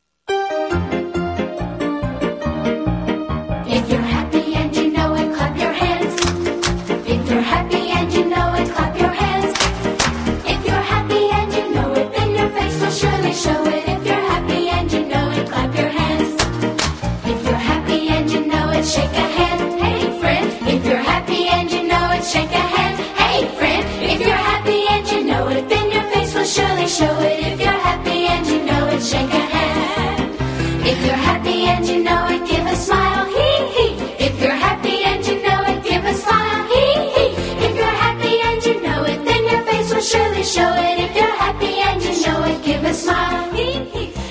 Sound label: english songs for kids